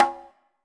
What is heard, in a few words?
conga rim; Greytsounds; Scott Peers Sound Engineering